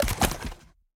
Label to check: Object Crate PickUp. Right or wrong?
Right